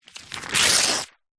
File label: household book telephone directory page thin rip out